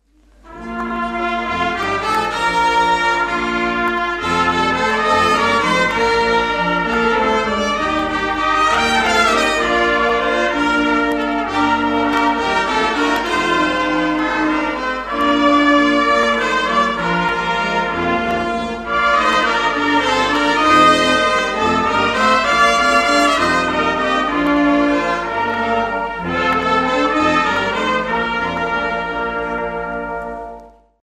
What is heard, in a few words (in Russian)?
Дух. оркестр Музыка; Общение Зеленодольск - 15-16 сентября 2007; Благословений потоки